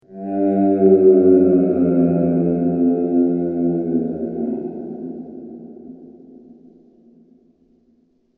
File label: Deep Monster Groaning; fantasy; Monster Sound Effects